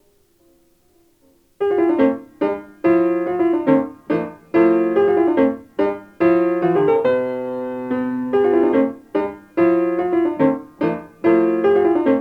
A Modern March

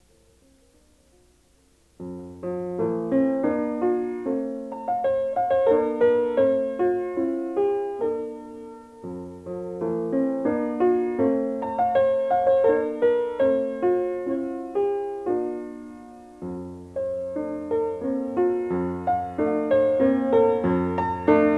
Ballad

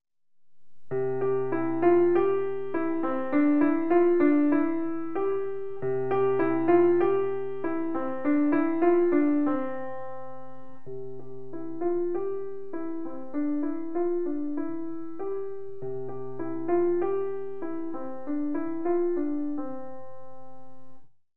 Musette

